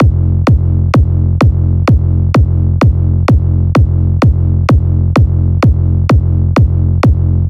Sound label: Bigroom Kit, F 128 bpm, Kick; EDM Drums; Drum Kits; Stems; Ghosthack; Ultimate EDM Drums; BigRoom Kit 02